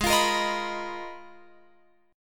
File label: G sharp Minor 6th Add 9th, Guitar Open F; G#m6add9 strummed; x,15,17,17,18,18